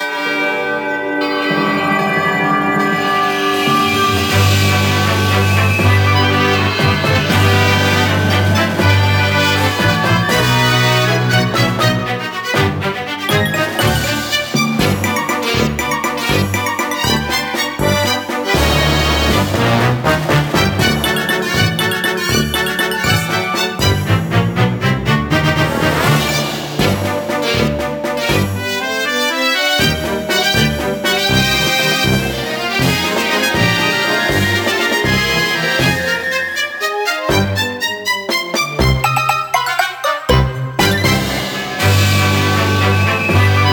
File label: Wedding Waltz; super paper mario